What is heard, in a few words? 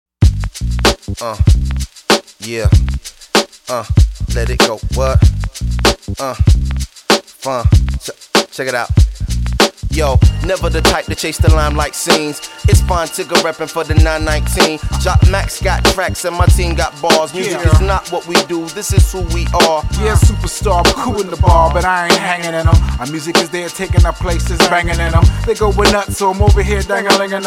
polyrhythm break glass